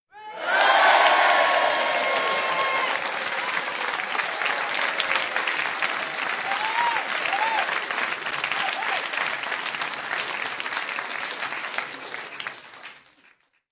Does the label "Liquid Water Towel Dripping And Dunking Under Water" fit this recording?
No